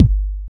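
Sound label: Cool Mini bass drum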